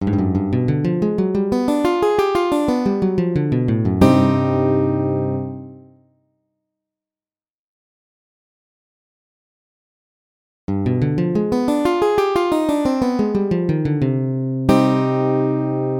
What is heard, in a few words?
7th lick to minor key G